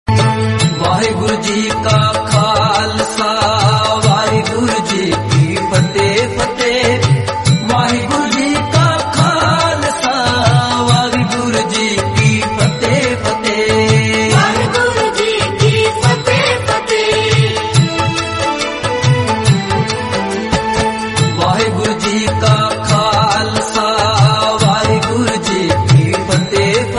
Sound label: waheguru ji ka khalsa